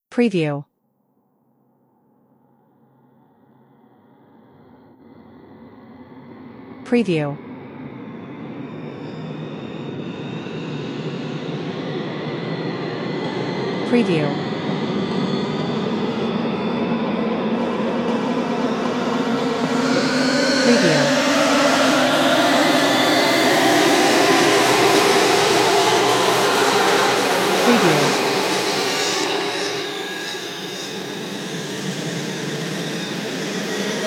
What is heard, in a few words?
deep noises, creepy ambient music